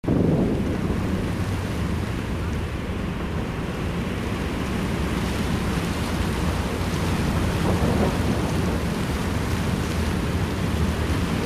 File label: Amb Trees